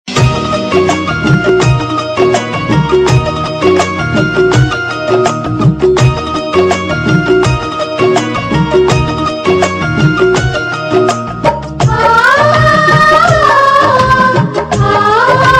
Instrumental Tune; Saath Nibhaana Saathiya

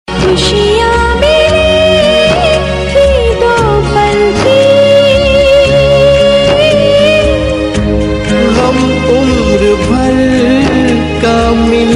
Sad Tune

